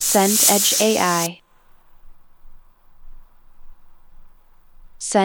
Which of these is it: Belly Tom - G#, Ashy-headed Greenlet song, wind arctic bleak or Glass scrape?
wind arctic bleak